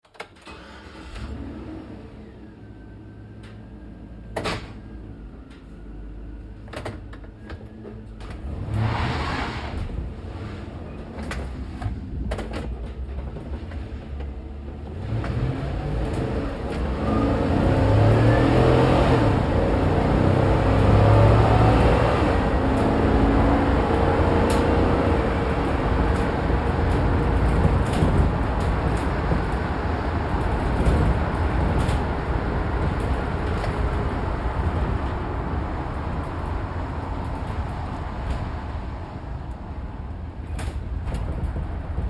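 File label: Truck GMC Savana Interior Drive Accel Decel Fast; Trucks; Vehicles; Big Room Sound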